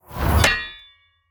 Epic Mace, Underlay Play

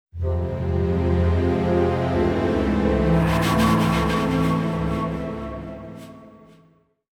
Pandaria Play Stinger

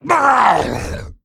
Male Imp Death

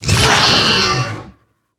Corpse Widow Death

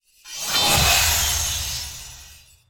Naaru Shard Attack

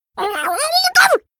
Murloc Tinyfin Emote Attack

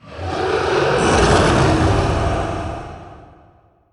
Underlay Attack; Shaku The Collector

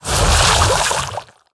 Blood Magic Underlay Attack

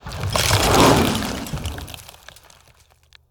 Underlay Attack; Lucent Bark

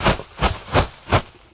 Wing Flap Medium

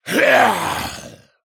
Ghoul Play

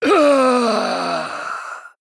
Male Human Death

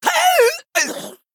female troll death

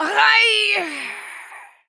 Female Dwarf Death

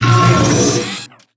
Attack

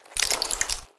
Crabmount

